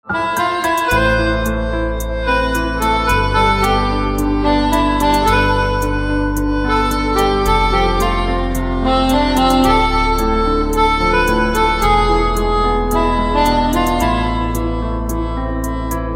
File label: Pianica Instrumental Ringtone